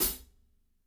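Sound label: closed hi hat, right; Spectrasonics; Burning Grooves CD2; HIT ME KIT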